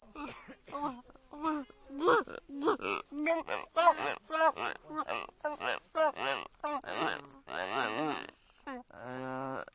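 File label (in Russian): ворчание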